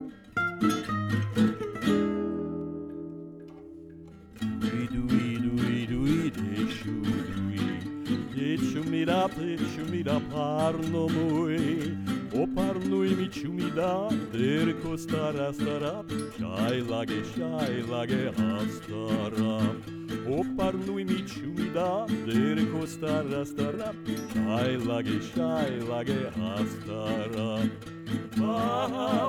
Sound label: slovak vocals rough mix